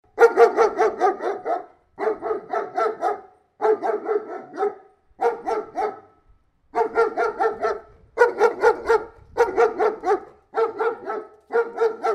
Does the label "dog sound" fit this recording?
Yes